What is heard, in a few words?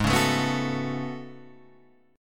A Major 13th, guitar